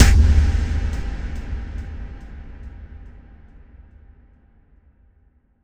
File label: Reverb Kick Big